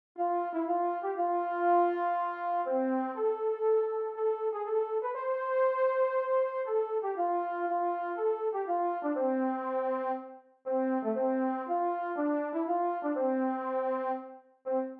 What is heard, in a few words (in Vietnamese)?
nhac midi